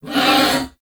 Ram Pain, Animals; Duviri

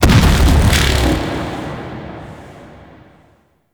Corpus Arachnoid Camper Artillery Cannon Fire; Warframe

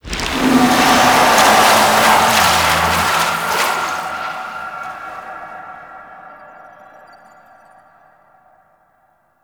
Hex Melt; Warframe; NineteenNinetyNine; Stage11FuturePast

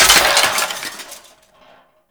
Bone Destructible; Ambience; Gameplay; Sentient; Warframe; Tau; Destructible Dynamic

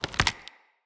Mockery Foley Melee Swing; Enemy; Mockeries; Characters

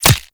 Player Bullet Impact Flesh; Warframe

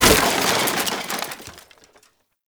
Wood Table Impact; Ambience; Gameplay; Warframe; Nineteen Ninety Nine

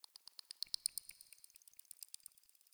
Clicky Insect; Warframe; Tau

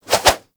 Staff Swing Spin; Warframe

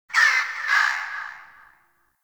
Night Bird Screech; Ambience; Warframe; Eidolon Plains

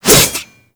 Light Glaive Whoosh; Warframe

